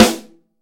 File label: focused acoustic snare sample e key